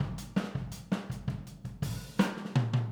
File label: Boogaloo Fill Overhead ST, 82 BPM, Drums; Sonic Foundry (Sony Creative Software) - Drums from the Big Room The Mixes